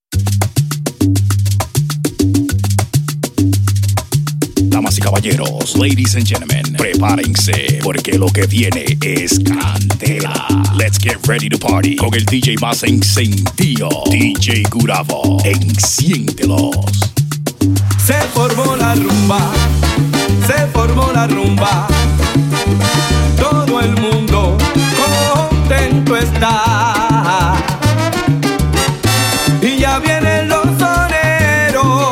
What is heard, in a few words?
Salsa Mix